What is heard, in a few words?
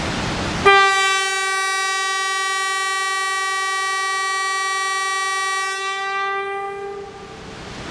Tram, horns; trains